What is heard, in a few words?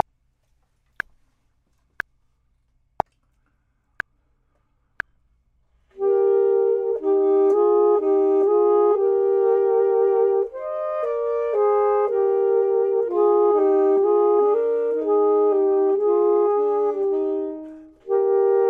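both, Saxophone and Clarinet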